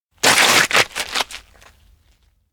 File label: steps outdoor mountain road slide landslide; anemoi